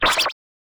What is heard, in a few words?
item put into, inventory; sound of item put into